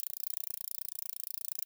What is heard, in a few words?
Fishing Pole Loop; Survival Game Sounds - Lite Edition; Combat and Hunting